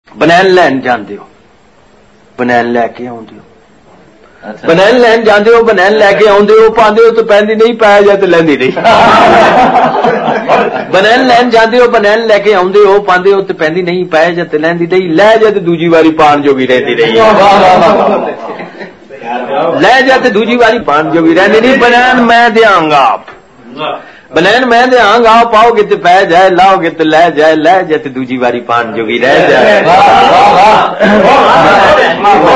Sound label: Mobile tone